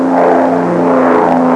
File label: CESSNA, Aerei; trasporti